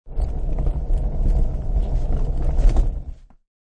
Shrt Lgge Roll, Short sound of luggage being pushed on concrete; Rolling; Objects